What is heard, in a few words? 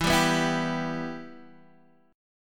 E Minor E, Guitar, Open D; down